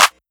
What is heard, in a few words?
CLUB CLAP; BWB X SUPA 2